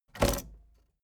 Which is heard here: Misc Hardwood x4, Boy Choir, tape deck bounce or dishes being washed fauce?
tape deck bounce